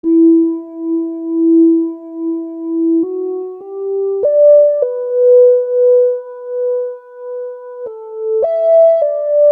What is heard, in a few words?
Keyboard